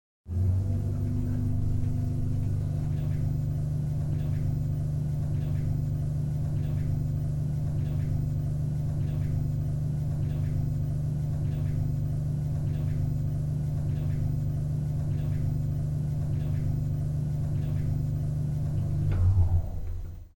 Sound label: elev